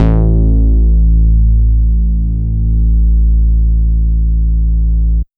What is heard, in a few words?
Bass Analog & Synth; USB Soundscan vol.12; AKAI